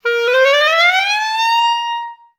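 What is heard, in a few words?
glissando A#3; Best Service; Peter Siedlaczek's Advanced Orchestra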